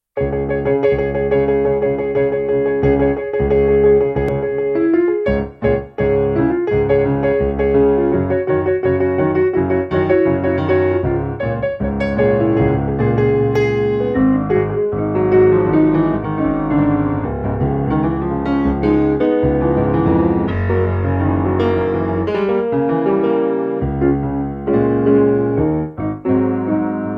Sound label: pc3 rock